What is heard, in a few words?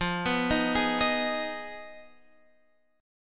F Minor 7th Flat 5th, chord; Fm7b5; arpeggiated; x,8,9,8,9,11